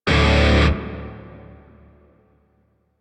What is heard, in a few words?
Shawzin Pent Maj Chord B; Music; Lotus Shawzin; DE; Warframe